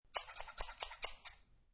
Quick Scissor Hair Cut; Offices; Scissors

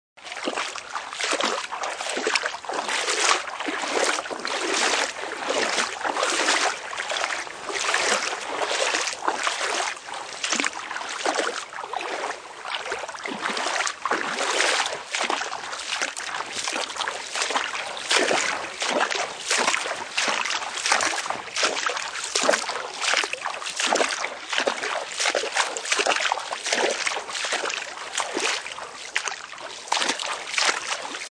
Walking through water; Walking through Water 2; Footsteps; Human

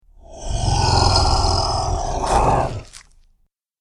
Dragon Roar And Bite; Monster Sound Effects

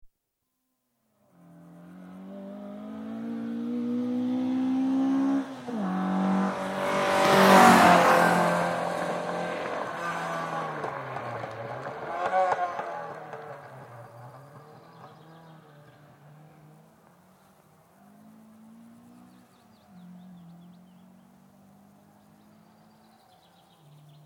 Rally Car Racing, cars pass on the straight; Racing Sound Effects; BBC

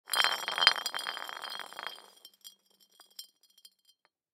Glass Bottle Spin On Cement; Spinning; Objects; Blastwave; Foley

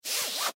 Cloth Movement Nylon; Foley; Dressing; Human; Blastwave

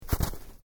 Footsteps Boots Snow Step; Footstep Foley; Footsteps (Sound Effects:Human)

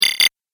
Game Power Button, video game

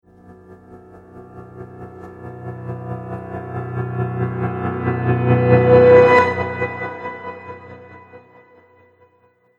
Horror Piano Tremble, Piano Sound Effects

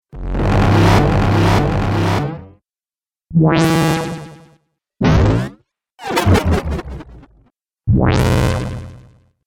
Dubstep Audio Laser Collection, sci fi

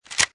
Machine Gun Foley Insert Magazine; Machine gun, type 1; Gun Handling; Lynne Music

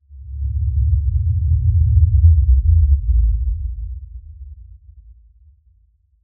Impact Lfe Rumble; Impact Lfe Rumble Pass By Bass Low; Low Impacts (Sound Effects:Impacts); Blastwave

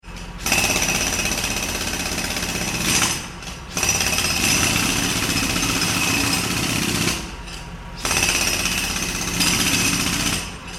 industrial tools jackhammers operating; 2x Jackhammers ( Pneumatic Drills ) Operating; Power Tools (Sound Effects:Tools); McKinney Sound

